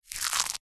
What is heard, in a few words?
egg shell already broken crush crack in hand; zapsplat